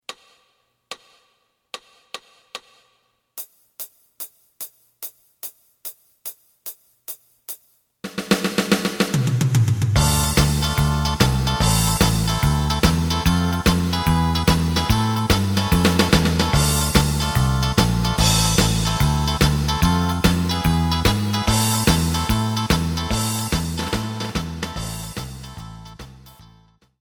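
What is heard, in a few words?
lead guitar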